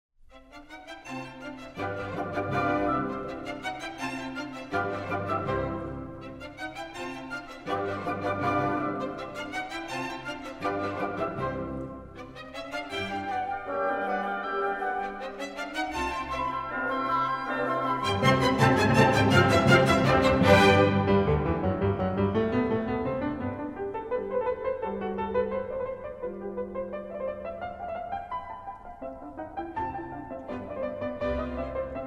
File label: piano concerto in c